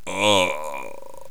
die; megaglest

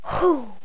genie attack; megaglest

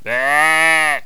sheep; megaglest

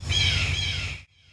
hawk; megaglest

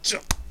spearman attack; megaglest

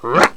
daemon attack; megaglest

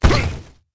orni die; megaglest

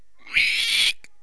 pig attack; megaglest

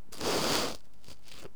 fire arrow hit; megaglest

